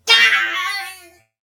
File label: death